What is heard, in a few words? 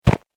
Snow Foot Step; human; Footstep Sound Effects